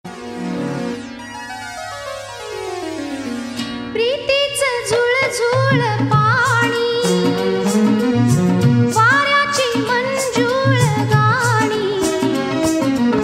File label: Marathi